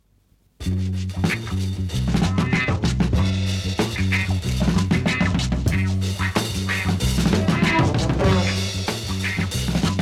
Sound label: Unknown Instrumental